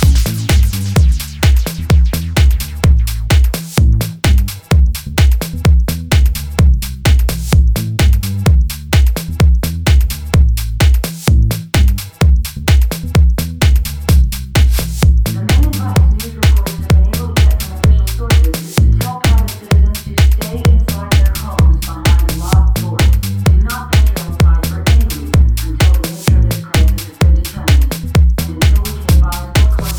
Disco

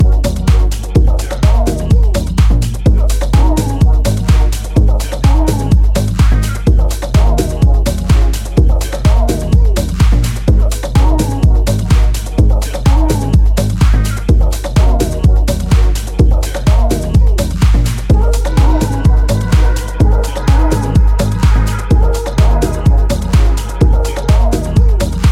Istanbul atmosphere

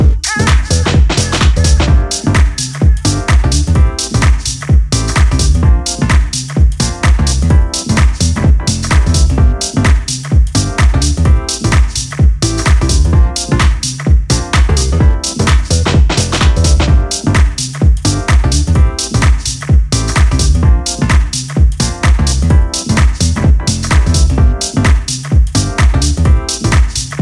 Be Funky

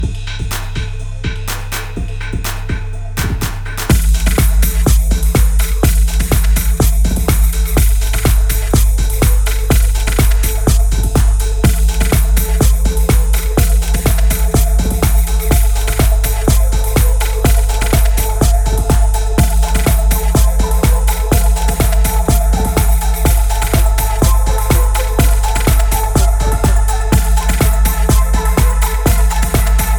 Dirthy House

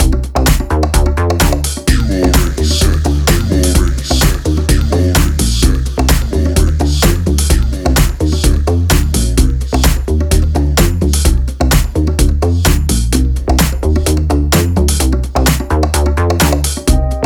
deep chord

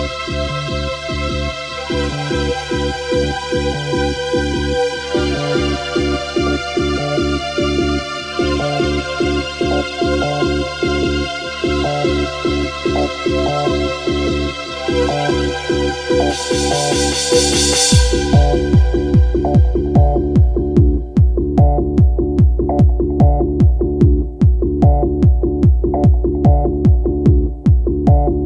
The Violin

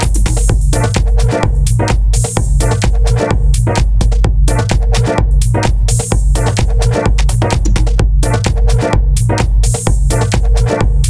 old Rhodes

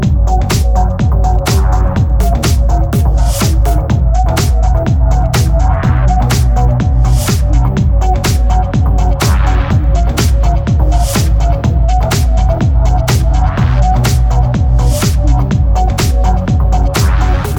deep and drum

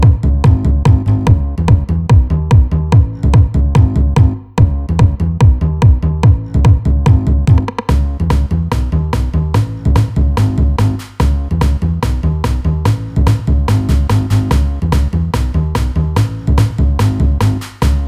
Drumb

